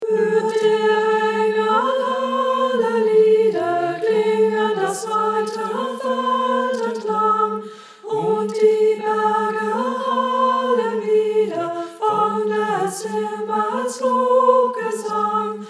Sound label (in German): Kurrende; Hört der Engel helle Lieder